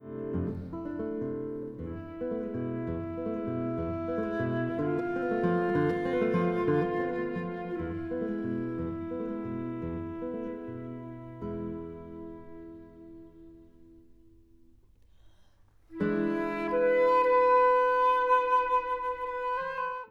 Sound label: arabe, guitar